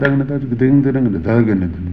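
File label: TABLA 124 BPM; RHYTHMLOOPS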